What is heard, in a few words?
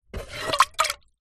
household water in metal pan shallow pick up from surface